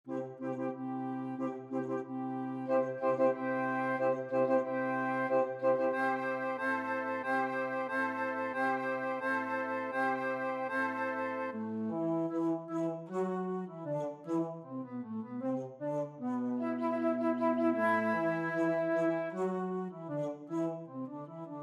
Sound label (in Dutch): fluit; Marche Royale de Lion; Carnaval des Animaux; Camille Saint-Saëns